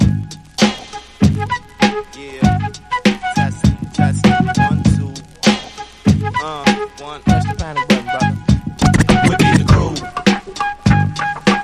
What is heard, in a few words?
REMIX INSTRUMENTAL